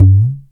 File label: Tabla bay, Roland MC-303 Percussion; Drum Samples